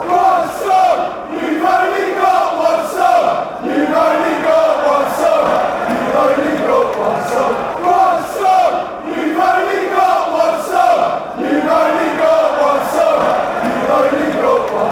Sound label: one song, fan chants